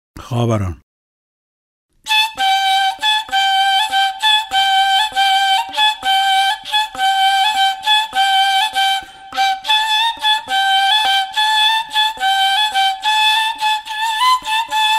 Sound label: Flute Dastgah Mahoor